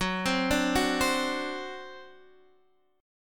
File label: C Suspended 2nd Flat 5th F sharp